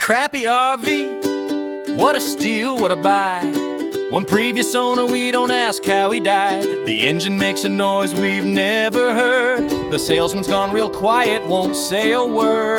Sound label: jingle; crappyrv